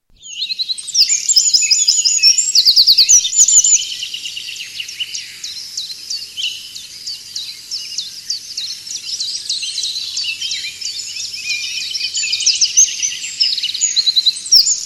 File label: Dawn chorus